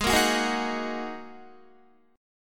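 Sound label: A flat 7th Suspended 2nd A flat Guitar, chord; strummed; 4E,4A,5D,5G,5C,6F; 16,13,16,13,15,13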